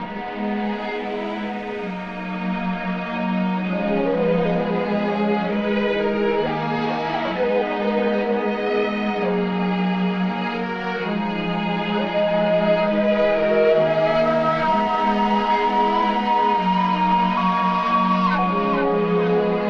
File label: string, sad; loops